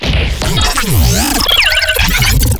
glitch stab; Virtual Riot; Glitch Stabs

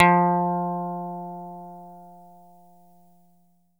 E-GITARRE, Alpine Volksmusik; Best Service

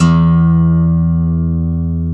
GUI Ac. E2, GUIT AC; Best Service Gigapack 2

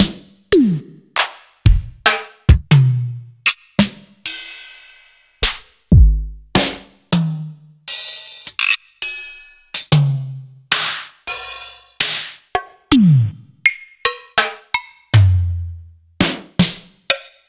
Casio CTK-5000, Samples Sliced

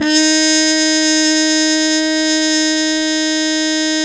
MUTE D#1, Mood Trumpet; E-MU Formula 4000 Series Vol. 1; Hip Hop Nation

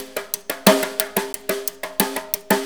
90 FLOOR, 90 PERC

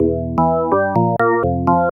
ORGN LICK, K E Y S

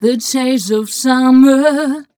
f mel d, fem Vocals

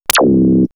Robot Command, synthfx; X-Static Goldmine

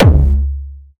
Jump kick, Jump hardstyle kick

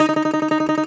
DOMRA, Russian Strings; E-MU Producer Series Vol. 7 – Old World Instruments

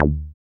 SYN 303, Roland TB-303

